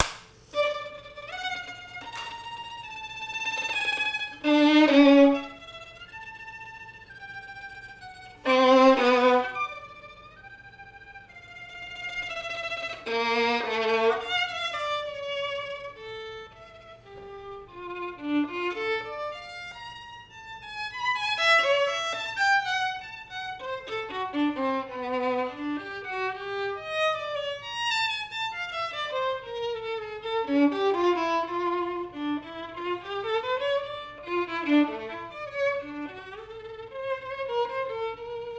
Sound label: piece trepidation